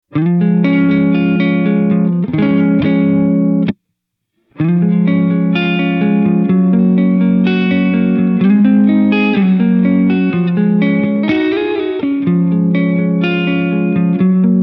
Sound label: Plate Classic, reverb; Blair